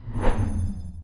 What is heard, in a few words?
Cast Shield End